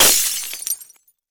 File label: Tension Impact